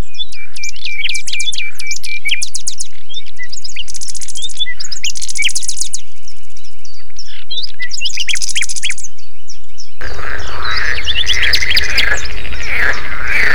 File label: Sonification of Network Traffic Flow